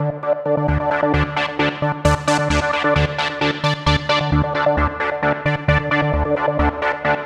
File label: Lead Synth; Dance; Synths Lead